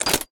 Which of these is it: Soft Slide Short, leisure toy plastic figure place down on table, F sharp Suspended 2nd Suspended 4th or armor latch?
armor latch